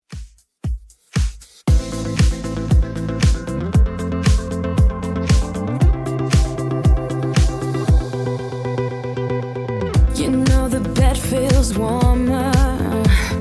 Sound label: 2ks Redrum 116bpm; remixplanet